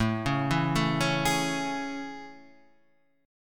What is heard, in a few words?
A Major 7th